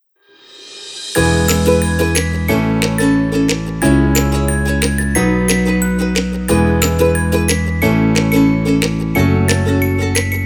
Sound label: sunny spring music